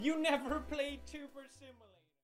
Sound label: Outro Vanish